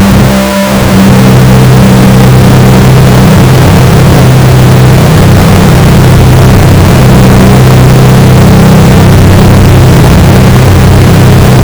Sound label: create a full continuous, occult